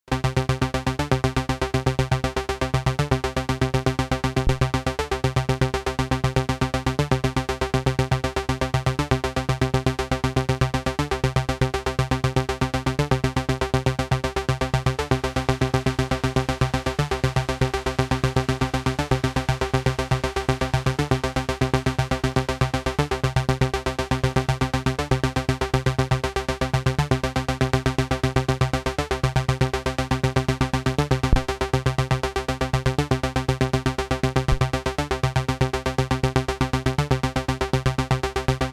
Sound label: chorus light von 0 zu max